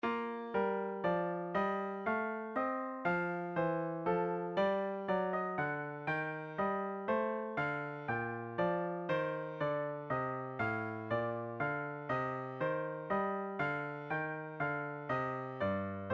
menuet en bb maj

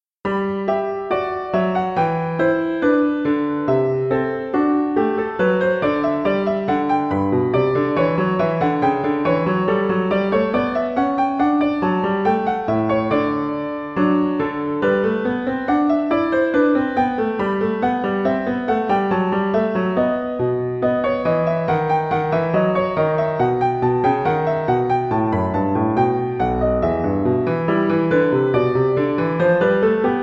gavotte en sol majeur

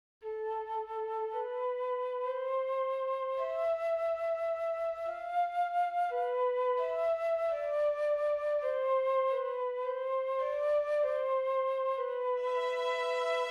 trio musical mineur

